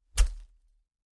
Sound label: FLESH CHOP, gore